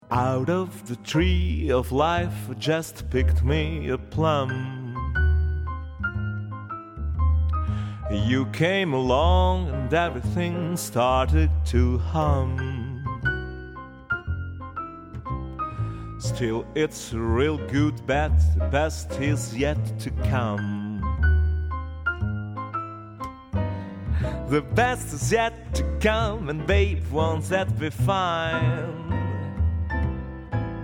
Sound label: male vocal